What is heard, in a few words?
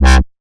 Basses Root C